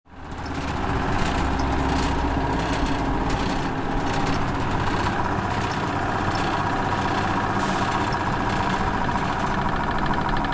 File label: Generator Startup